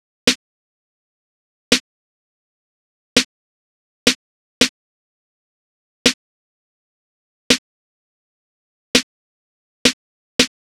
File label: Drum Loop 166 BPM Snare, Trap; Loop Stems; Chamber